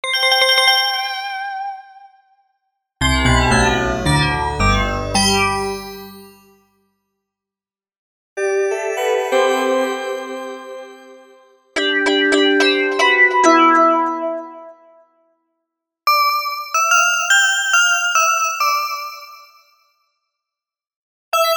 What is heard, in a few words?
percussive